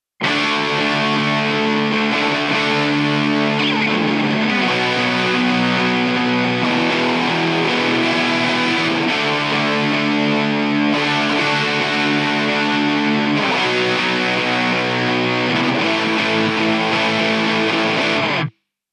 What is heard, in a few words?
MIX guitar solo